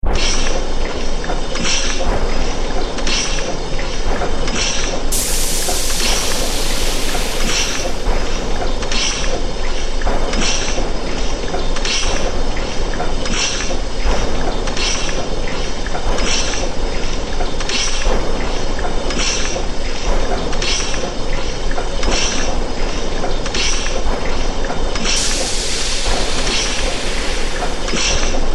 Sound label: Industrial Revolution, Recorded steam engines mixed together to create a huge atmospheric V; Recorded steam engines mixed together to create a huge atmospheric Victorian steam industrial sound-scape.; Machinery